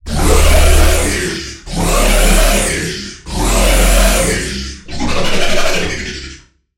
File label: Growl; Zombie King; Routed Sound; Vital Expansion - Chaos Bringer Vol.1